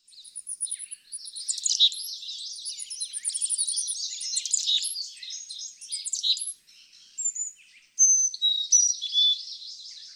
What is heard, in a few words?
Carolina Chickadee